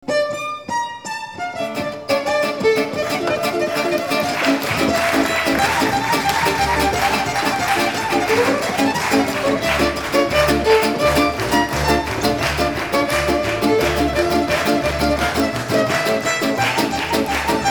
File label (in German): instrumental